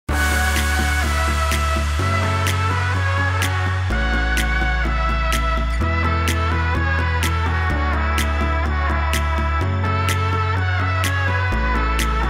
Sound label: remix gospel